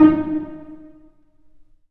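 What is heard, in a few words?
cello pizzicato D#1; Digital Sound Factory Virtuoso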